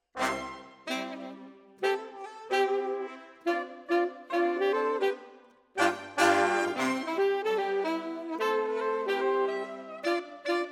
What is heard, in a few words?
Sax